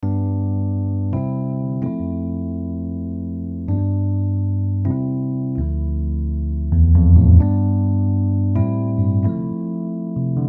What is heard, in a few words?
Rhodes LA Custom Warm